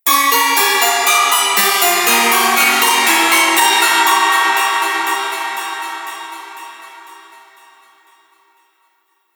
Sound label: A Haunted Musical Stinger; Ghost Sound Effects; Horror Sound Effect